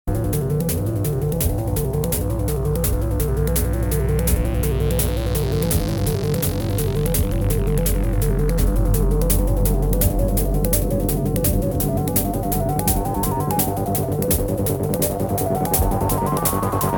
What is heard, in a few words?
Crosley CR249 Turntable Normalized